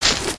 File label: Pet Warthog Dig; creatures pets